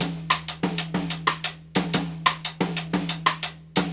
TOM RIM 6 8, Drumworks; Sampleheads; New York City Drumworks VOL-1

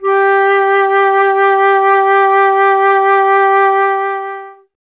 FLT, Solo Instruments; Miroslav Vitous - Solo Instruments VOL-2

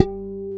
GUI HRMFLA, Acoustic, Roland

